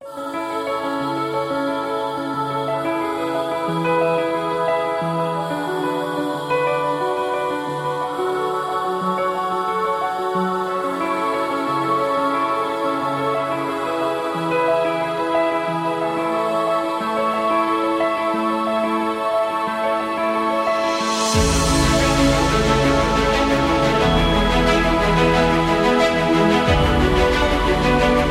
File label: Epic Love